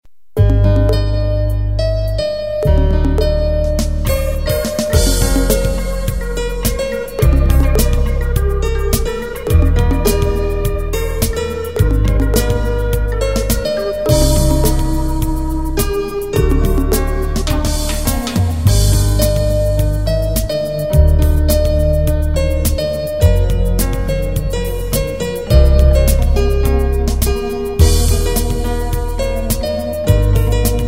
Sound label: Piano Dance